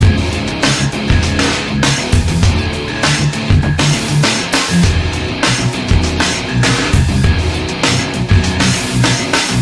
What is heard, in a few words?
Result Win; ACR Drift